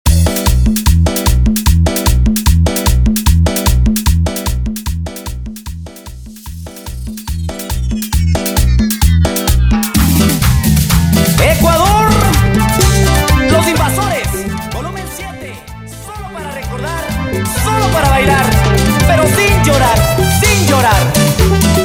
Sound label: transition, 150 TO 126 BPM